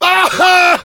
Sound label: Demoman laugh short; Rire court 3; Demoman voice commands